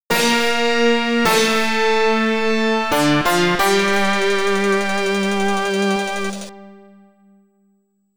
Game Over [FM]; Cynthia 1994 version; X68000

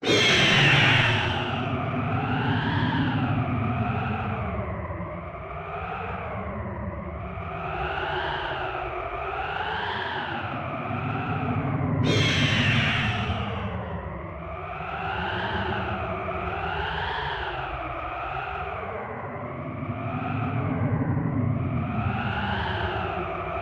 ambient flying on the dragon; Mystical Ninja Starring Goemon; koryuta

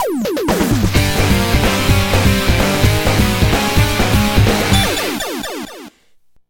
Boss Rush Jingle

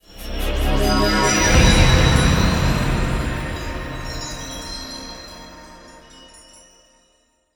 Stinger Achievement Full; starcraft 2 wings of liberty

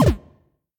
Landing; Pac-Man Championship Edition 2 Plus

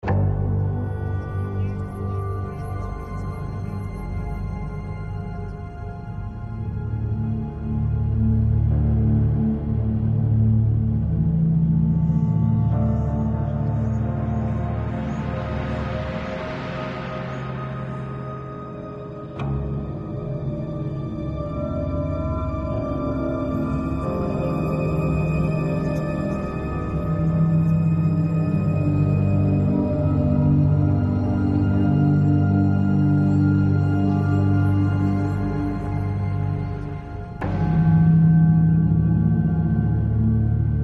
Loop Ambient

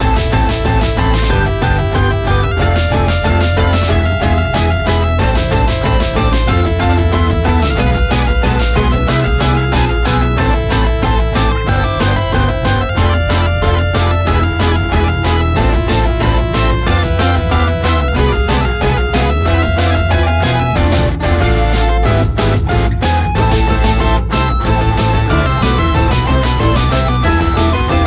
Bossfight 185bpm; Mus Gameplay Level Bossfight2 C 185bpm

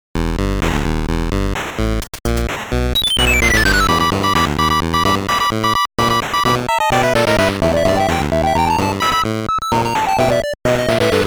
Boss Battle 8-bit Version

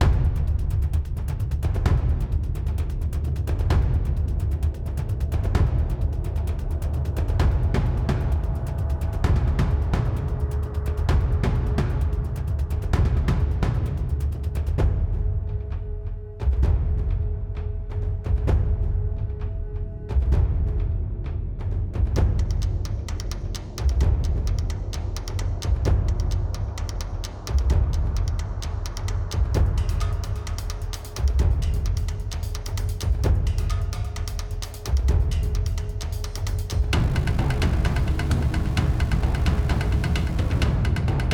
Ambience; Neptune; Battle Of Sol

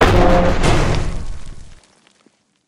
Bridge Collapse; lethal company; ambience; windows

